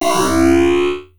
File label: Sci fi